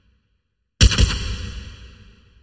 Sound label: socom silenced; mgs